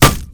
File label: wood box impact bullet; physics; Counter-Strike Global Offensive